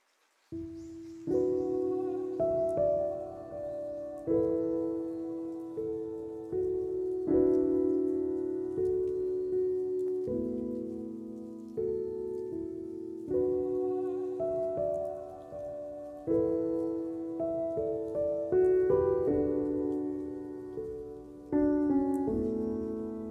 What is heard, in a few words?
lofi hip hop